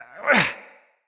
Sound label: Attacks Vocals Male; Bromius; Wazard; Envoy